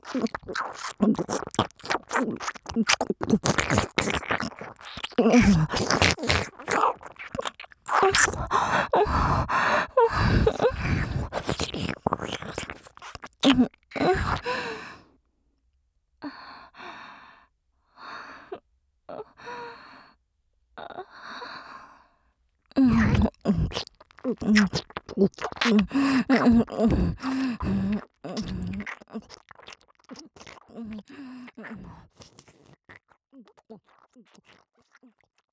左右交互耳舐め, ASMR; 耳舐め&吐息ぐっぽり10時間♪

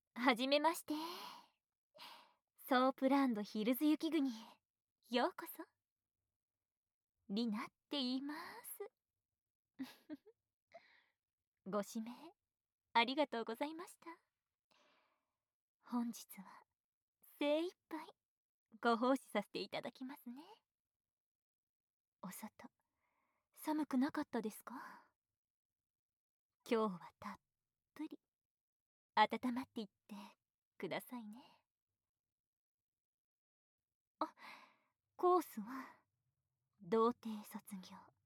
誘惑吐息淫語ベロチュー耳舐め, ASMR; おちんぽイラつかせ; SEあり; 高級ソープでドスケベお姉さん

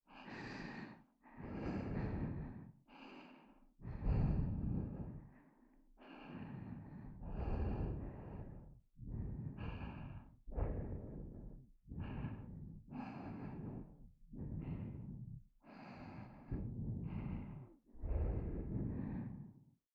吐息, ASMR

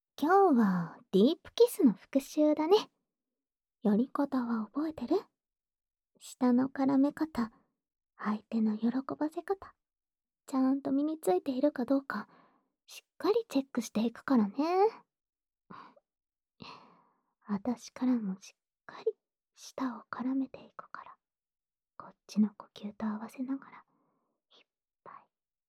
オナサポ用ディープキス

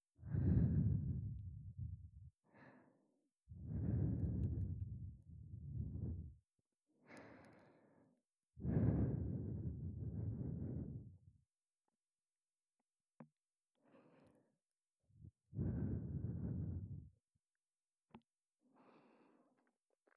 吐息（左）; 無感情なアンドロイドの寸止めオナサポ

